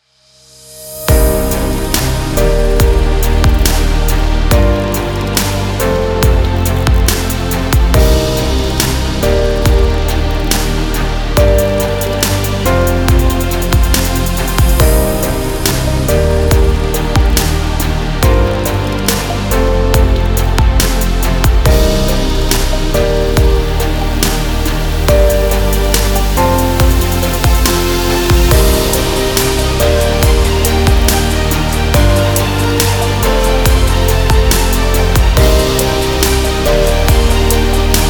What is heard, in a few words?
Inspiring